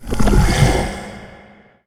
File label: boss umbra respawn